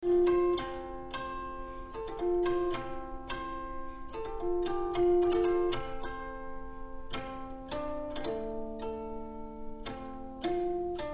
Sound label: Blow Pluck Hit